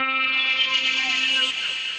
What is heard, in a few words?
Itchy Lead, Synth Lead; Ableton; Factory Packs; Vibrations; ModeAudio